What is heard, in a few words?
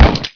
FLING